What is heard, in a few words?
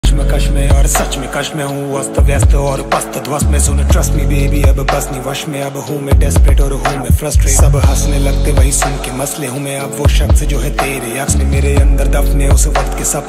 hindi rap ringtone